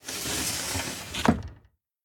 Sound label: Door Slide Open Glass; The Inn